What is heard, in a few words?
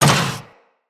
Step Default, Footsteps; Moa; Moa Combined